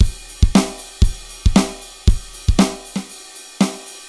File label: Roland TR 6 Loop 160